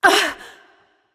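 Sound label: surprised, female; smite; voicepack; hera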